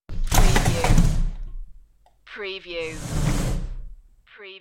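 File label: Drawer Open Close; Sounds in the home of drawers being open or shut.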